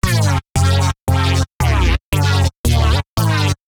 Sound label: Reese; neuro; BIG5AUDIO